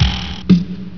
drum pat

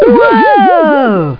Bonus; bonus2; Tetris Max 2.3

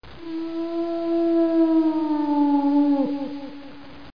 howl

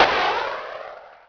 Hellfire Shot; shadez

